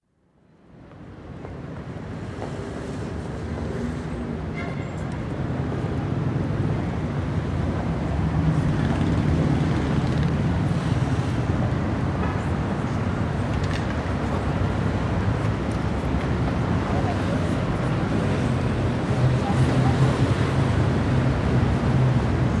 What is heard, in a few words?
France, Avenue Des Champs Elysees, wide perspective with heavy traffic, pedestrians and occasional close speech, police; Traffic And Car Sound Effects; BBC